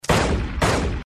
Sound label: gun shots